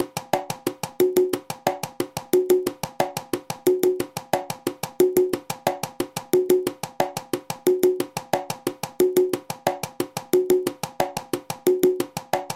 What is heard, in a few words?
son montuno conga